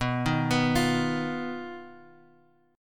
B Major Flat 5th B Guitar Open G

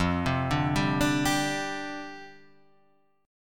F 6th Suspended 2nd F

